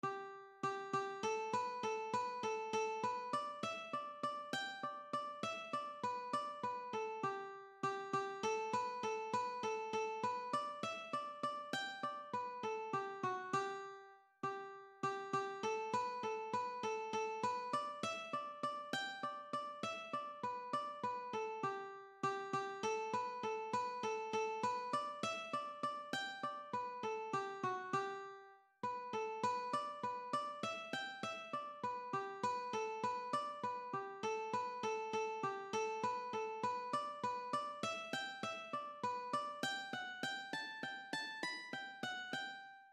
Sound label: Kesh Jig Midi